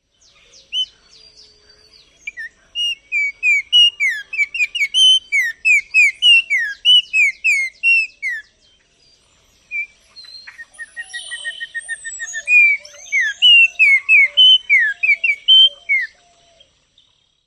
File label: songs